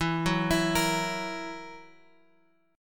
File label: E Suspended 2nd Flat 5th E, chord; Esus2b5 Chord {X 7 4 X 5 6} arpeggiated